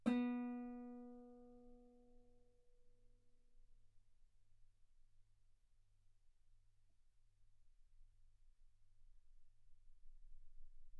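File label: Guitar ff B string B3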